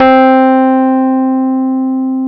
C 3, Electric Pianos; Wizoo

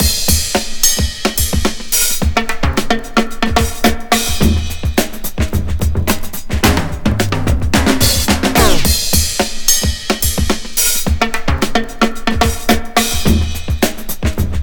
108.4 breaks; Lockdown Breaks

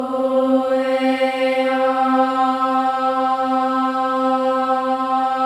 OH EH; Spectrasonics

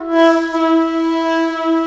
SHAKU E4, Asian Traditions; Soundscan vol.60; AKAI

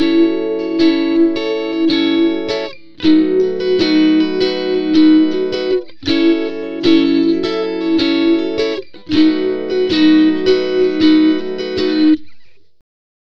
Rytm Gtr F#, Slo Mo Funk, Lounge; Zero-G Kocktail Kollection; Construction Kitz (Lounge)

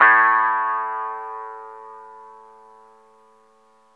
BASS, A2; Zero-G; Chemical Beats; BASSES

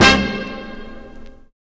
STAB

